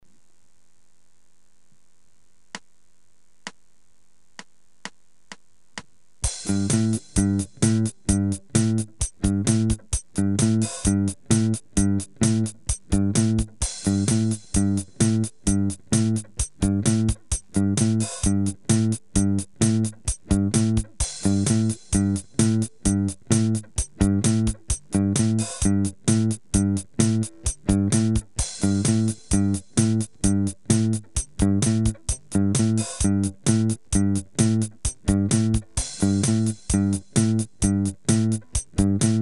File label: Bell Bassy Riffs 130; Rhythm